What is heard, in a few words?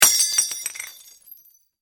Impact Glass Break Wine Glass; Materials